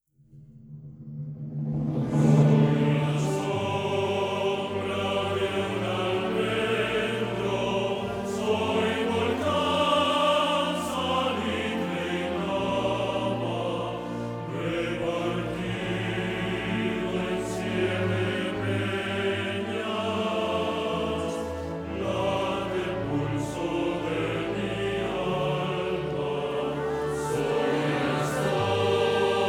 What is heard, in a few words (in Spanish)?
himno de Canarias orquesta vocal; himnos autonomicos